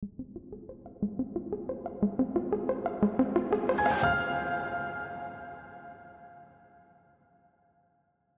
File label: logo